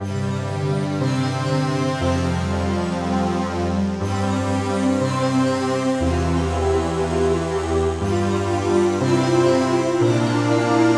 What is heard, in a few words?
Underground, Synth Alternate Resynth